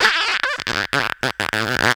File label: VOC DRUM, MOUTH PERC; Best Service Hyper Dance